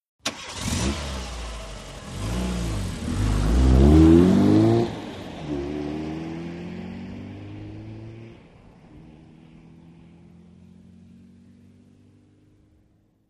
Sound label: Aston Montego On Away